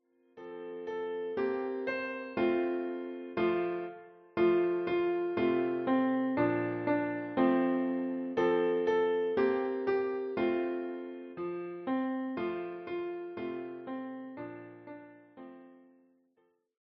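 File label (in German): Old Mac Donald Klavier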